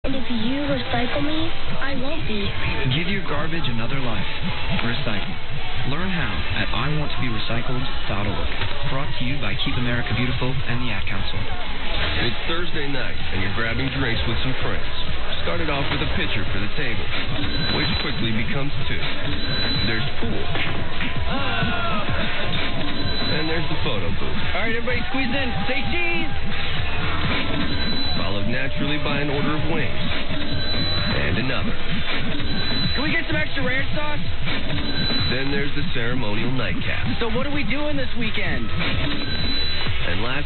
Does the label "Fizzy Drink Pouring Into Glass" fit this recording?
No